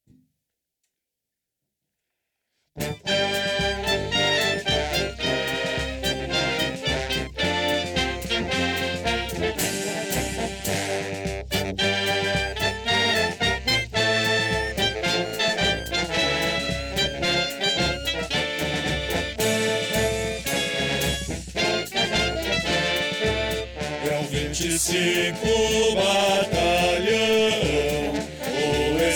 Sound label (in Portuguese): cancao 25 bpm com vocal